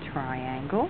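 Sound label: triangle; G-Netix; ISM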